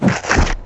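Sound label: mutant attack; baseq2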